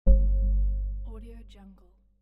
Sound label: percussion